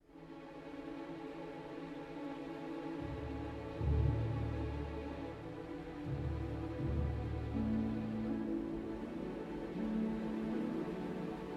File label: World Music